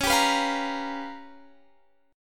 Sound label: G 7th Sharp 9th Flat 5th